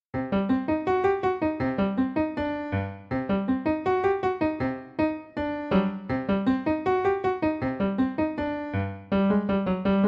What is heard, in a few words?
Creepy Crawlies